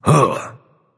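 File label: nonverbal ugh; smite; voicepack; merlin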